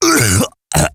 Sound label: cough; player